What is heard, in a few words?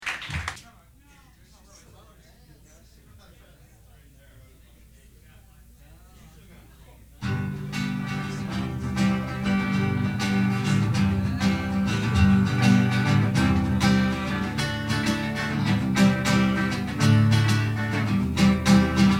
Acoustic Guitar Duet